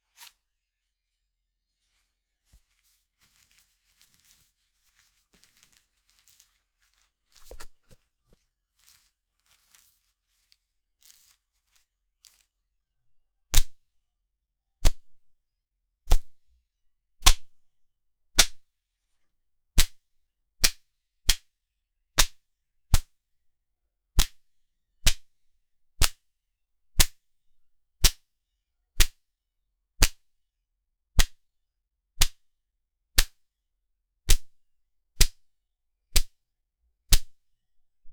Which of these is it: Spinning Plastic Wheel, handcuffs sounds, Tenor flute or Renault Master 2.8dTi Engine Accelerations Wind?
handcuffs sounds